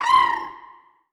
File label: Seedling Death